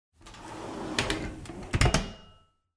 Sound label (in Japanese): ohuro